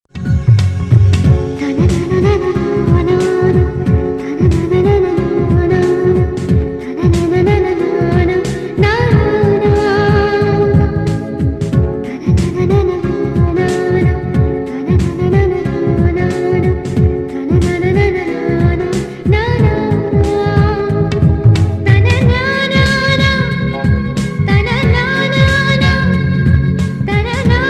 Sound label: love bgm, tamil love bgm